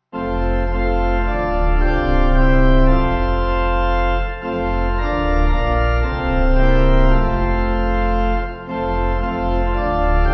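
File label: Pipe, Pipe Organ backing; Arise O God; Rhosymedre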